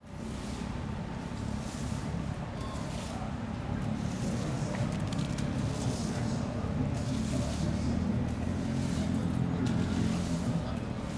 Ambiencia de Garis Limpando o Setor Comercial Sul de Brasilia

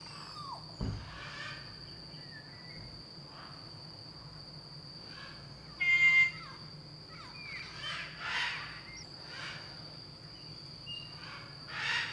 Canto de ave que parece buzina araras no fundo; aves

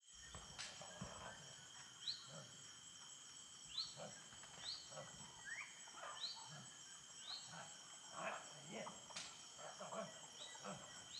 Ambiencia no Curral da Fazenda com Boiadero Conduzindo os Bois para Dentro; ambiencia rural

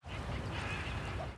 Arara Gritando de Passagem no Parque da Cidade de Brasilia; aves; Coleção Sonora do Cerrado

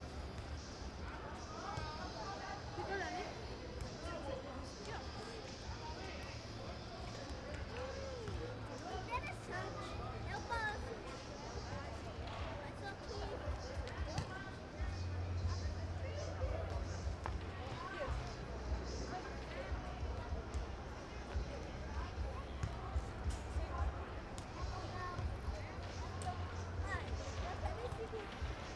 Ambiencia praca taguatinga a noite com pessoas conversando, criancas jogan; criancas jogando bola

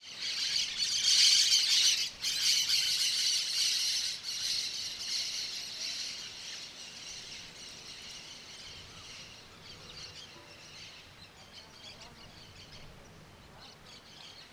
Revoada de grupo de maritacas e periquitos; aves; canais surround 5 1; Coleção Sonora do Cerrado

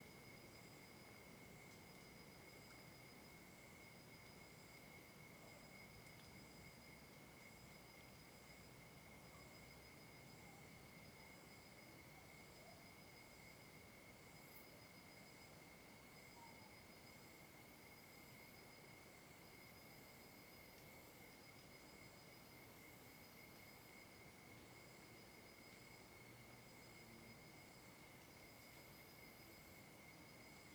Ambiente fim de tarde dentro de trilha cidade com musica longe; ambiencia rural

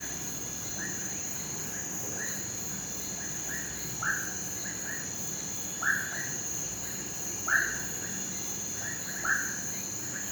Sapos ambiente rural a noite coro de grilos e cigarras, cachorro longe; animais; Coleção Sonora do Cerrado

